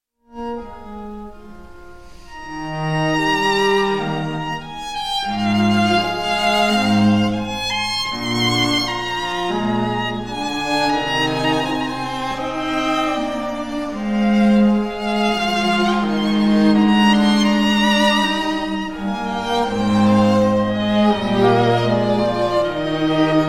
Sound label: string quintet in b flat major; Wolfgang Amadeus Mozart